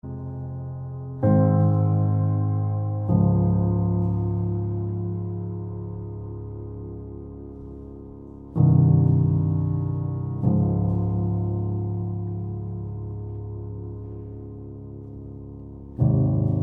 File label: Giallo, Arr. For Piano